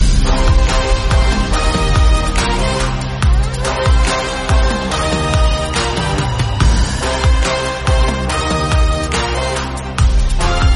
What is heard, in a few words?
background music; valmiki